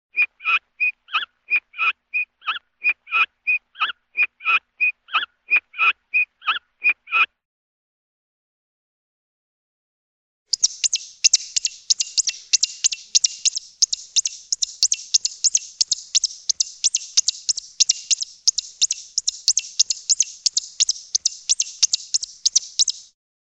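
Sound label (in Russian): непрерывный короткий писк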